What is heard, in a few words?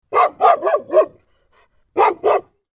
Dog barking loop